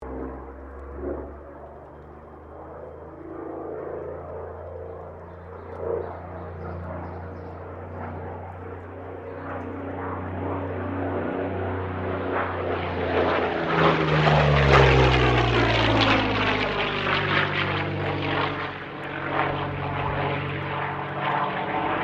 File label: AIRCRAFT P-40 KITTYHAWK PASS STEREO